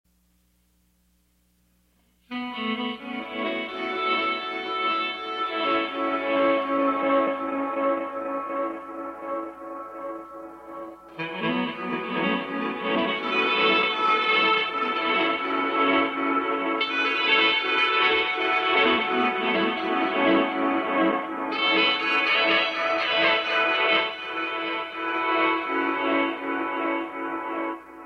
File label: sax forward tape effects